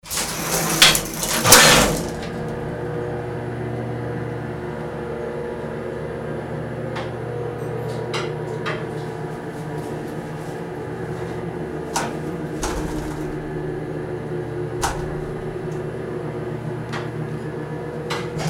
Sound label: elevator old style